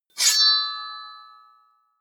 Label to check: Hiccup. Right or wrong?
Wrong